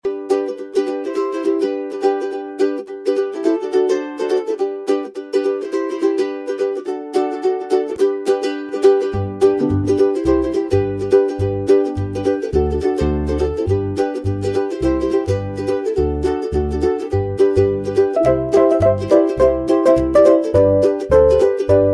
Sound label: ukelele